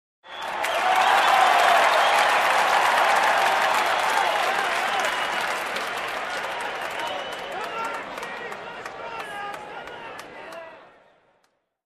Sports Game crowd cheers and applause

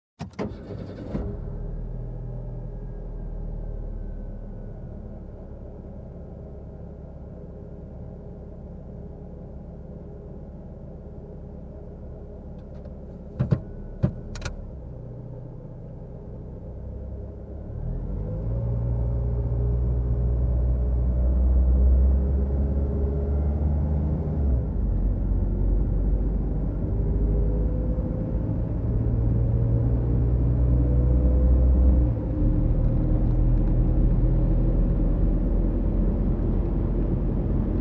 Car engine starting and driving

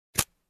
Lighter Switch On

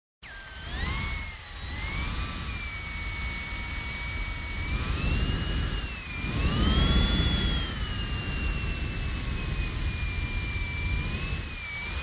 Device blowing air

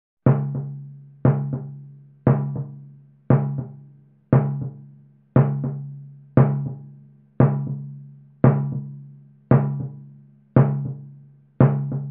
Dampened Timpani groove

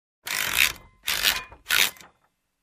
Ratchet sound

